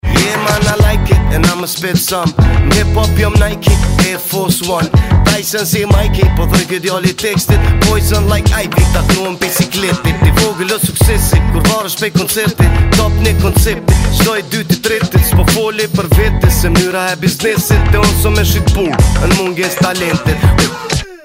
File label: HipHop